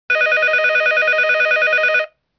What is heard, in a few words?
incoming; softphone